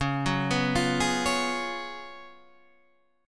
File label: F sharp Major 7th Suspended 2nd Suspended 4th, C sharp